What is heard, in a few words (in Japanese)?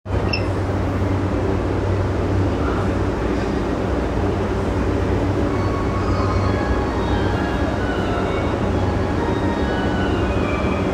南北線本駒込南, train sounds